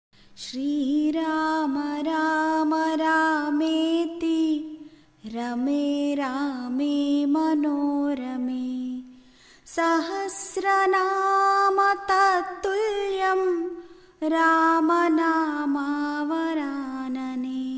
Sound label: Ram Taraka Mantra